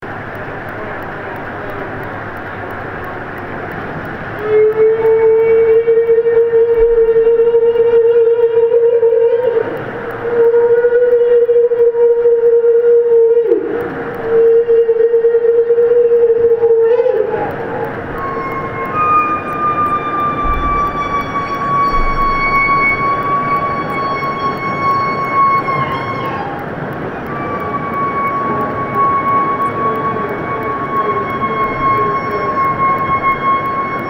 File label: humpback whale sounds